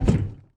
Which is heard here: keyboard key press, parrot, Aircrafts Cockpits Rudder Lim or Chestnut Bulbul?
Aircrafts Cockpits Rudder Lim